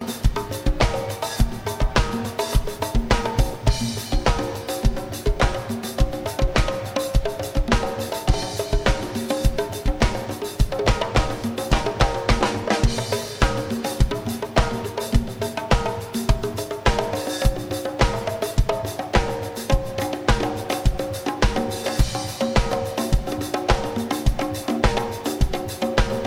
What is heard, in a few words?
Drums